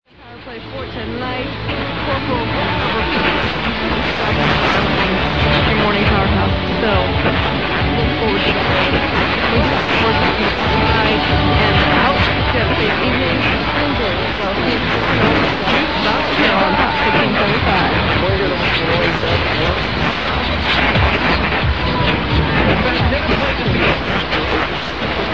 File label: 1575kHz; AFN Misawa